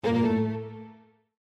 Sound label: Critical sound